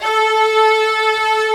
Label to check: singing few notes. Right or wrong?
Wrong